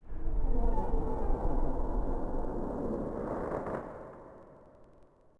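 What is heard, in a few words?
dungeon ambience; poolofradiance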